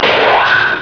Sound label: phasers